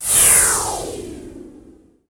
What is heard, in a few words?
fx reverse; Ueberschall; Techno Trance Essentials